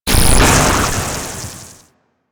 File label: Surveillance Drone Cloak; Warframe; Grineer